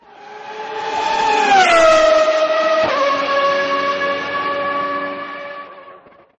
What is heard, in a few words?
F1 Passing; Mclaren